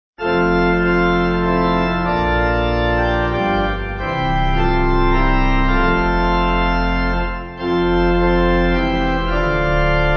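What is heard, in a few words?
Pipe Organ, Music Backing Files for Churches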